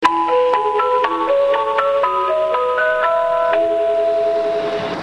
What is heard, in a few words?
bus chime; 西武バス